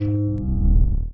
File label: Happy